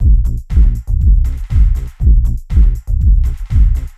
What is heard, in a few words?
Music Distant Car Deep Loop; SFX Kit; Foley - Misc